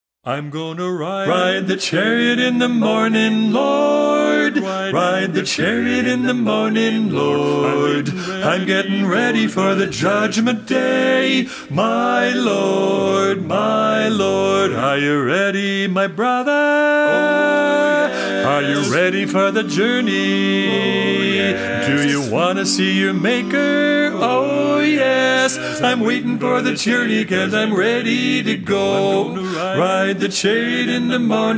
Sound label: Ride the Chariot Lead